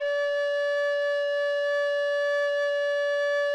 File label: D5, kobliczek; SoundBytes - Early Patches